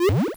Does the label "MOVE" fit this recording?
Yes